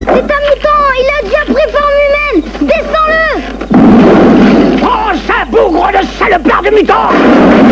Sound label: pin